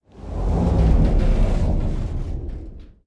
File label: damage; World of Tanks